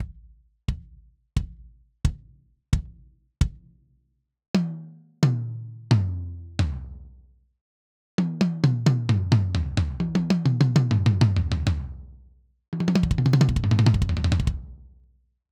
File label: Kit Yamaha